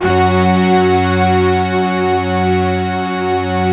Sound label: SYN BRASS, DANCE